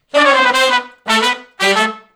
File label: Funk Riff (G) unison, Horncraft for R&B; Sections